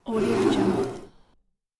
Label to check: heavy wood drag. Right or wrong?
Right